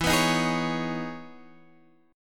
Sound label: E Major 13th E; strummed; 12,14,13,13,14,x